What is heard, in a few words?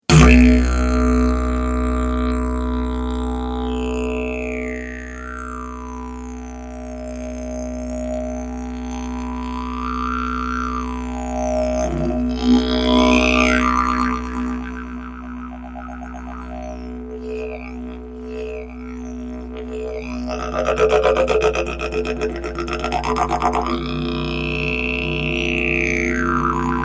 didgeridoo